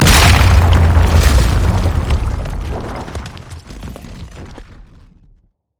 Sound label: turret break; order turret